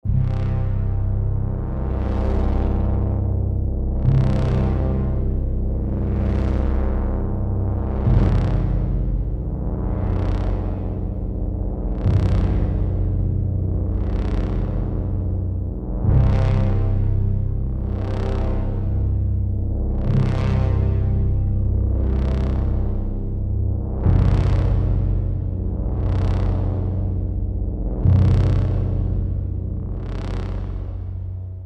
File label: wormhole no strings; Poptropica